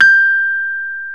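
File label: piano Rhodes Mk.V; PNO; Roland LCDP10 Keys of the 60s and 70s 2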